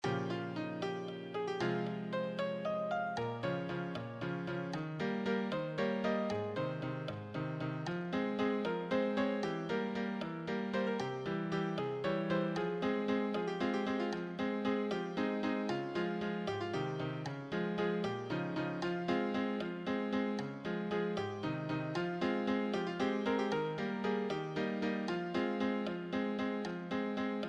solo, piano

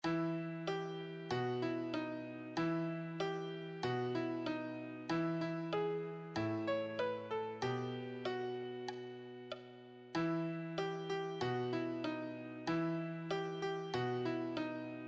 beginner solo, piano

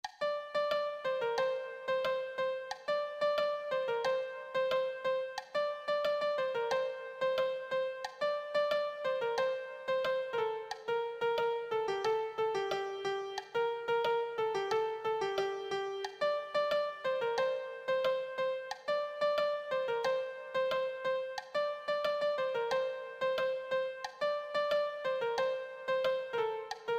lead, piano

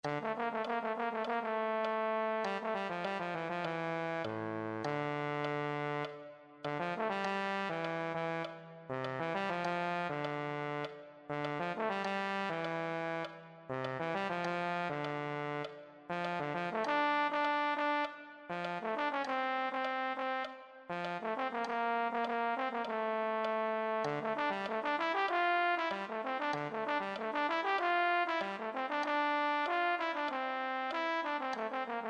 euphonium

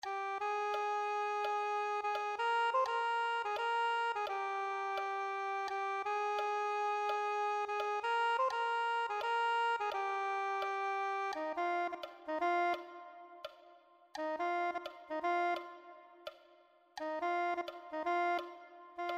soprano saxophone

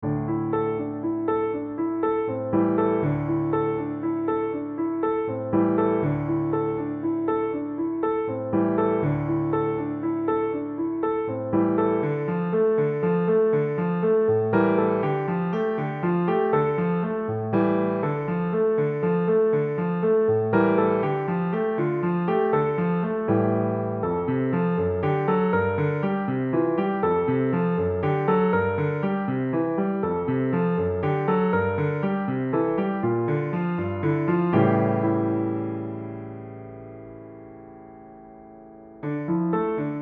solo, piano

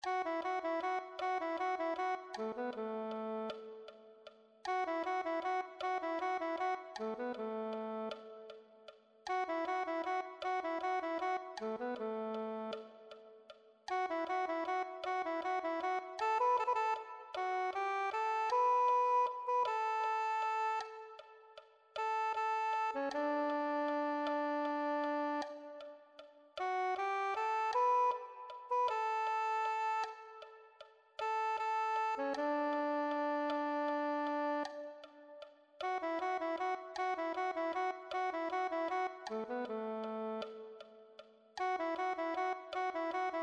soprano saxophone

